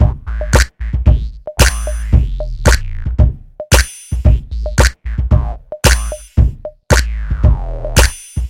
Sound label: Drums Bass